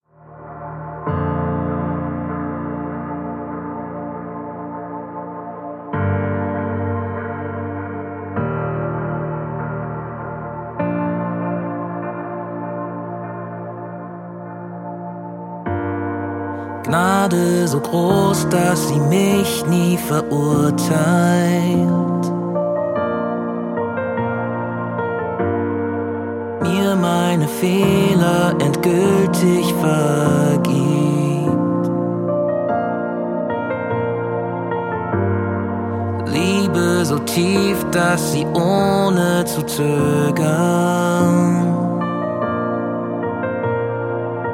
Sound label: Samba